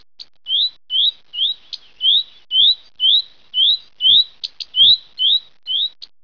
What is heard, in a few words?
Common Redstart